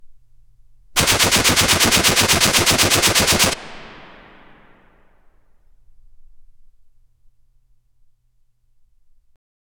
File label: shot of a weapon